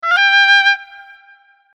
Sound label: Oboe, notifications; Vertu Signature Touch; LSO